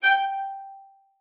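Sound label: violin spiccato G5; Xsample VOL-5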